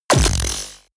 sci-fi shock blast

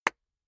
clapping very slowly

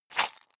sundries shake hard; berufe; handwerker; soundpool

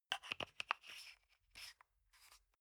toothpaste screw cup close soft; haus-garten; badezimmer

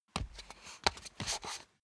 step barefoot wood parquet scuff; menschen; schritte

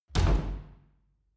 wood door old reverberant

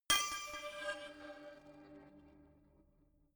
notification glockenspiel crazy single low; instrumente

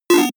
feedback negative 8bit

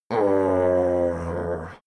male grumble annoyed; menschen; koerpergeraeusche; soundpool

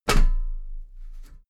step metal ladder step top regular

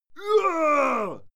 male fight moan heavy; schreie; menschen; soundpool

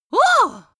outcry boy; vocals; comedy-cartoon; soundpool

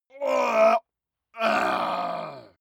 male groan painful double; menschen; schreie

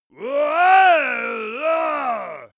monster male growl aggressive; kreaturen

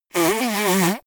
nose blow

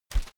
whoosh shredded paper soft deep; swooshes-swishes

